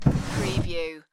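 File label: Dresser Drawer Open; Sounds in the home of drawers being open or shut.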